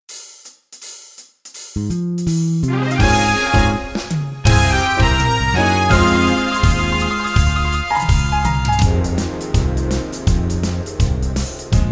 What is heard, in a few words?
brass minus